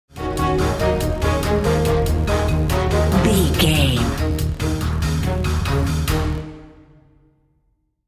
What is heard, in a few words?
pumped big game stinger